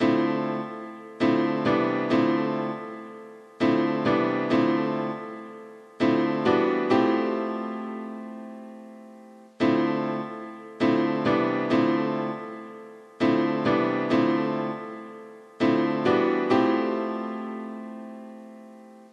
smooth jazz cafe

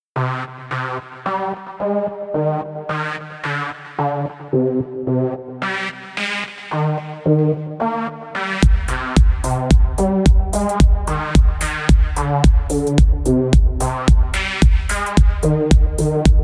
techno trip 432 hz

